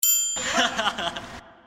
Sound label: HAHAHA